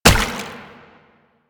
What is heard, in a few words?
Step Water; Warframe; Proto Infested Brachiolyst